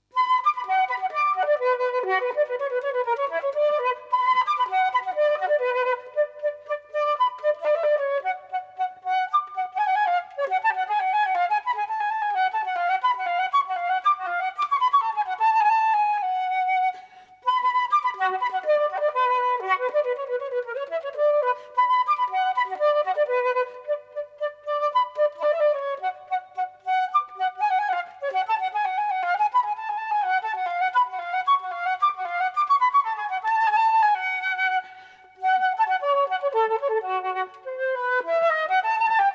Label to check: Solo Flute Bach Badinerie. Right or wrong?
Right